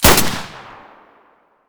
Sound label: m9a3 muzzle mono; beretta